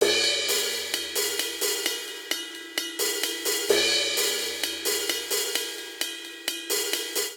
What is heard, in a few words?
Driving Rock Cymbals Only 130 bpm; 4 4; MIDI Clips; Ableton; Factory Packs; Drum Booth